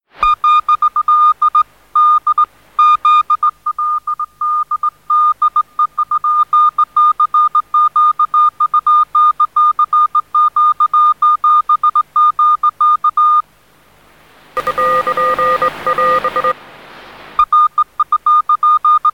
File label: cw swing